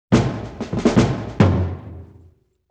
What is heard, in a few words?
Snare Fill With Drum Hit; Vintage Cartoon; Drums & Percussion